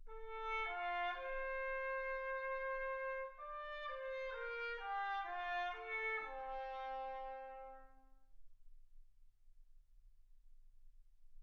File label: trumpet